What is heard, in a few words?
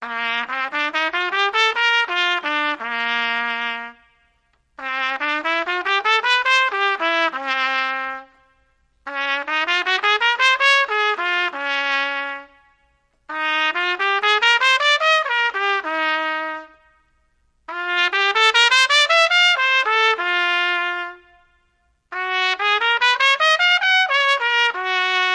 Trumpet Good Practice Scales With Reverb